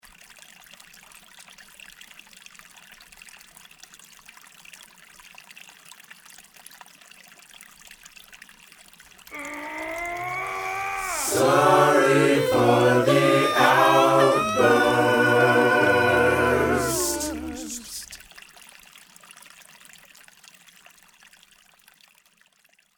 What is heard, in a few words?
outburst